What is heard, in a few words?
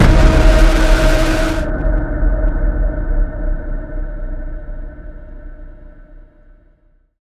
jumpscare sound; jollibees phase 2 ending